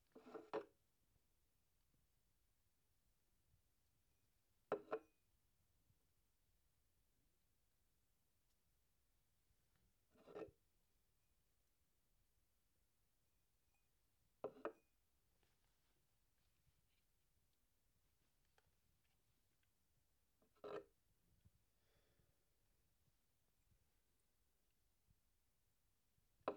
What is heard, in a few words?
Placing Glass